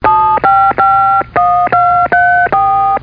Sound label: phone; OS/2 desktop